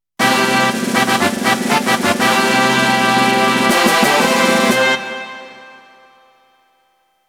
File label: Race Fanfare (Ranking Time); dot hack; Puchiguso